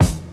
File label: 2000s shiny kick single hit f key; kick drum samples